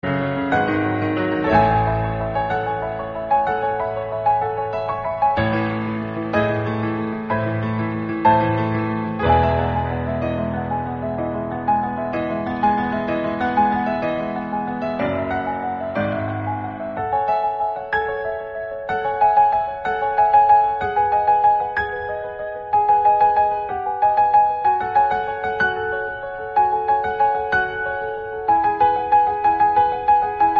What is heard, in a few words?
INSTRUMENT RINGTONE